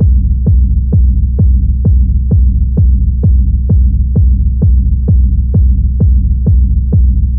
bigger techno kick

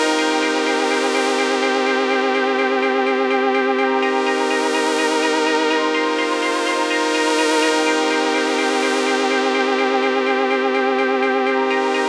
lush pads

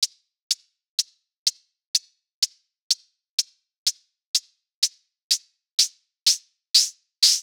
Hat loop

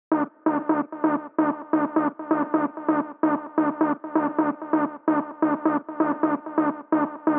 Techno Stabs

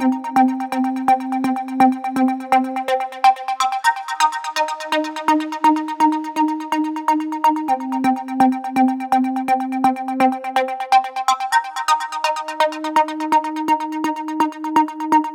delay stabs

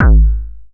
Hardcore Kick

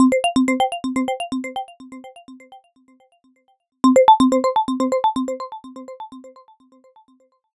Notification bells

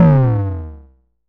Electric tom

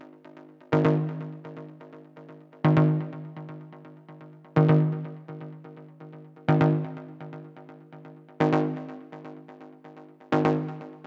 squared stabs

